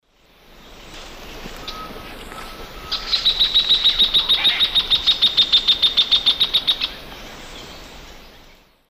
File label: Furnarius minor, song; S. America; Eastern Lowlands